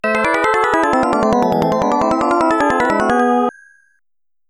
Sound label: My Ship Capture Music